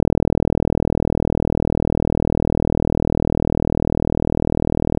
buzz

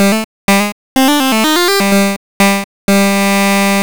key

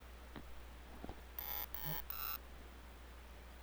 Noise High

